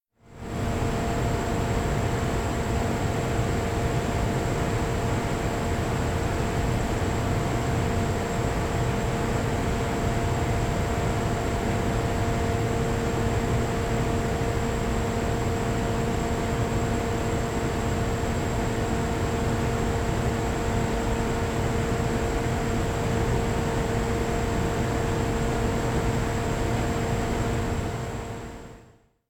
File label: Washing machine; KMP Film Video Sound Effects